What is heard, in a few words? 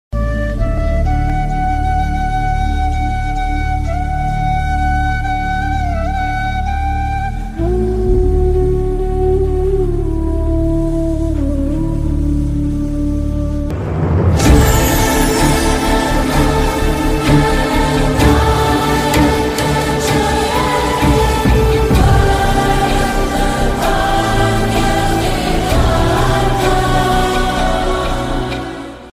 Flute, Ringtones; Deshbhakti; Jana Gana Mana